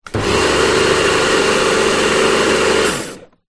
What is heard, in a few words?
industrial pressure washer kartcher k2 on run close to motor; zapsplat